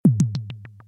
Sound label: Alien Deep Hd